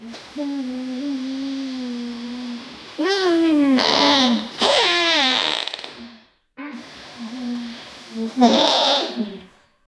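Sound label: an old furious lady farting, fart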